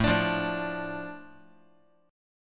A Suspended 4th Sharp 5th A down Guitar, chord; Asus4#5 strummed; JGuitar

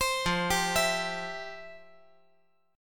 F Major 7th Suspended 2nd, F, down, guitar

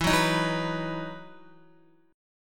E Major 7th Suspended 2nd, Guitar; EM7sus2 strummed

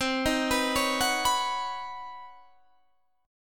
B Major Add 9th; arpeggiated; Badd9/C; JGuitar

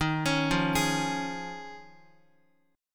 Eb 7th Suspended 2nd Suspended 4th Eb, Guitar; arpeggiated; chord

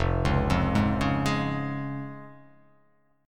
F Minor Sharp 5th, chord

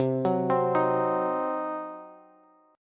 C Diminished C, Bass; arpeggiated; JGuitar

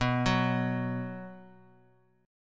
B 5th B, chord; arpeggiated; 19,21; JGuitar

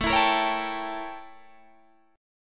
A 7th Flat 9th Guitar Open D

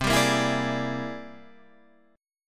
C 7th Flat 13th C, chord

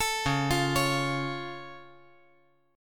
C sharp Augmented down, chord; arpeggiated; JGuitar

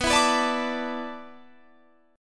D 6th Suspended 2nd B, chord; D6sus2/B strummed

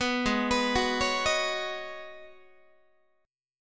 G sharp Minor 11th G sharp Guitar, chord; G#m11 arpeggiated; 14,16,14,16,17,14

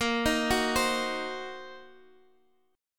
C Minor 7th Flat 5th A sharp, chord

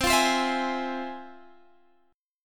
F Minor Major 7th, chord

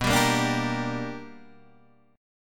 B-Minor Major 7th Sharp 5th B down Guitar Open D

